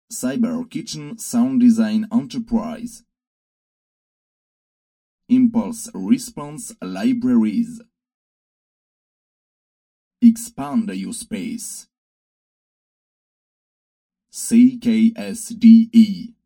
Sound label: stoneware